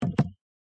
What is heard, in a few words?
Pick Up Plastic Bin; Lethal Company; Windows